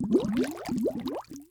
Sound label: Bubbles; Doodle Jump; 3DS, Android, Arcade, DS, iOS, Mobile, Xbox 360